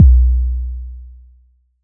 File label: Kick, Drums; Bitkits